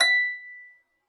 B6 HARP; USB Soundscan vol.62; AKAI

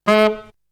Melody oneshot; ZERO G - Datafile DISC 3

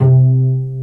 PITZ STRINGS

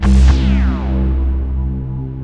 SFX, FILM SCORE; AKAI S6000 CD-ROM - Volume 1

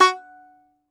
Tenor Banjo, Plucked Strings

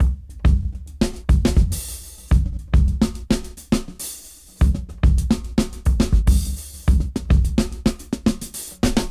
Jazz Dry 104 BPM; AMG - Keith LeBlanc Phat Butt Jams

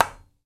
SHARP HIT, Indian Ensemble

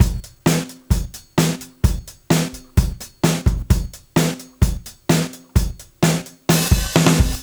129, Beat Loops; Zero-G; Monster Beats

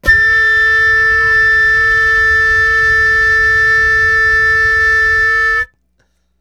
FL HUM A6, FLUTE HUM; Greytsounds - Philip Wolfe David Crozier's Saxophones

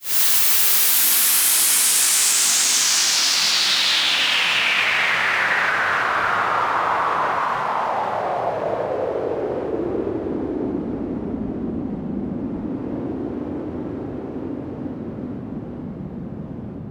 Sweeps Down

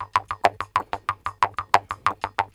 KHOMUS

